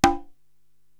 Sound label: Conga Hi Slap